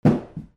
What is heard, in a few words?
FALLING